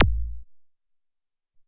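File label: Zap Kick, Alesis SR-18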